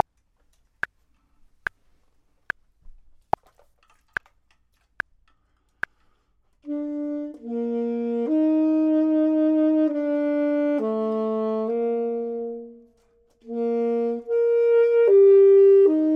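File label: bottom, Saxophone and Clarinet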